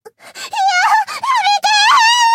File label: anime girl scream